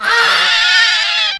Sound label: die; mahlendur